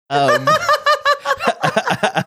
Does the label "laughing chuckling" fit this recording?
Yes